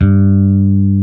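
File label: HARD FINGER, Classic Guitars; E-MU Formula 4000 Series Vol. 3; Analog Odyssey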